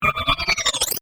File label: Ascend Chopper; Production, Element, Ascend, Burst; Ascends; Sound Design; BlastWave